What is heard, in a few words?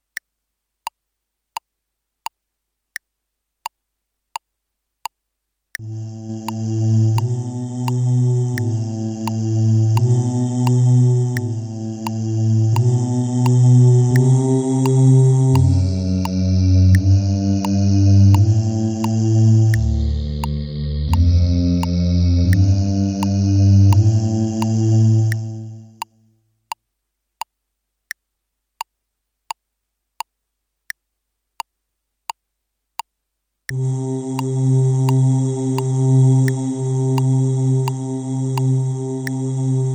Basses